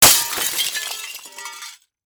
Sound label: Crash Glass Pane